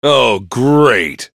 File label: soldier positive vocalization